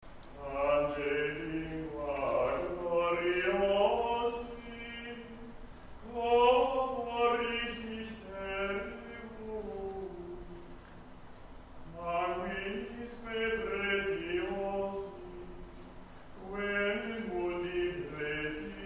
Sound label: pange lingua chant; Gregorian Chant; Pange Lingua, Crux Fidelis (Hymn With Refrain)